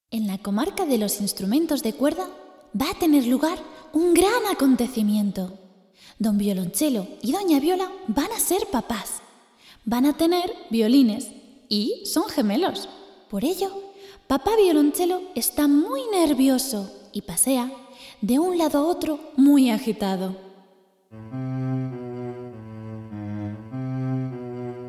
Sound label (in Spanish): violoncello nervioso